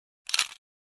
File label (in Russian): Щелчок камеры